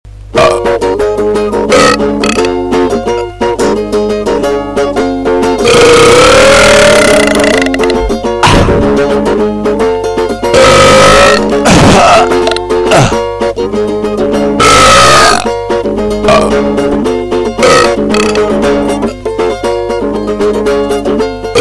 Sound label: reggae